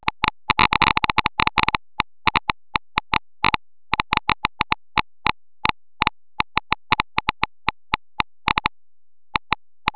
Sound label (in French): son geiger